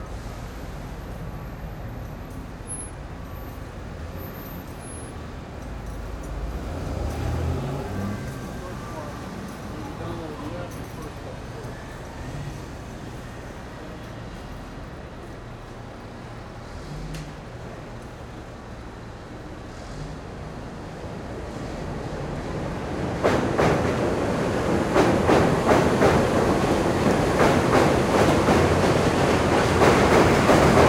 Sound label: BUSY STREET crossing street, L train, traffic in the LOOP, few people, Downtown Chicago; Busy Streets Ambience